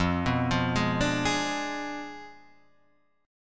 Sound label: F Diminished 7th F down Guitar Half Step Down